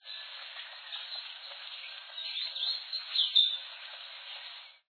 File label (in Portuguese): saira-lenco